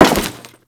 Crewman Step Default, Footsteps; Warframe; Corpus

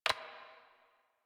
Computer Keyboard Stroke; Warframe; NineteenNinetyNine; Messenger

